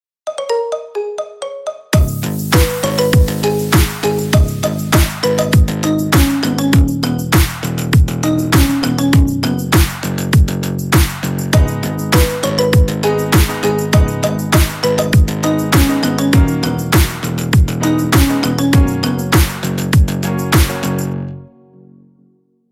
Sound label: Marimba Remix